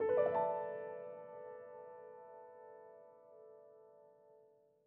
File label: Fanfare; StickerClone